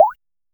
gentle water

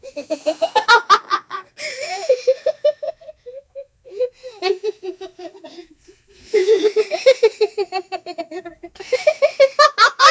9 year old african, laughing

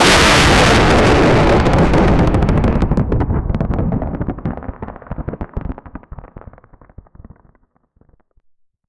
intense crackling electri, thunder

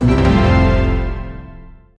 short victory jingle insp

